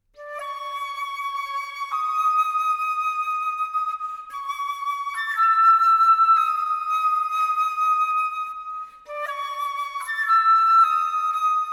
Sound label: solo flute